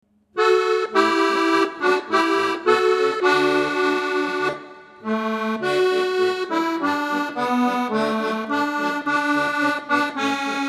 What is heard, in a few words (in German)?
inst; aus grauer staedte mauern